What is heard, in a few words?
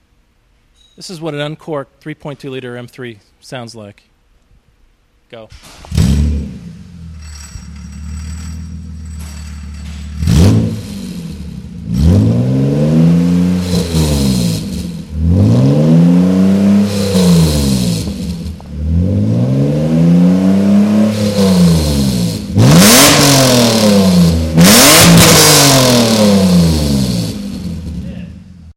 Stock System, car; EuropeanCarWeb